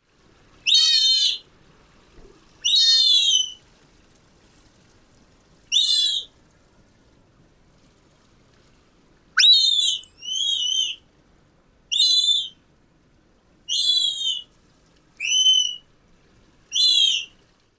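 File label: Sea Otter pup screams, parabolic; Seattle Aquarium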